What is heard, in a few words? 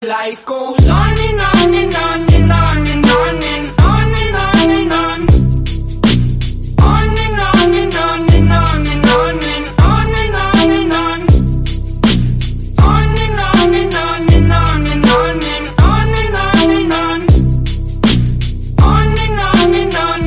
English Song